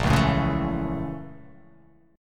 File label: Bb Minor Major 7th Flat 5th; BbmM7b5; strummed; 6,4,7,6,4,4,x,x; JGuitar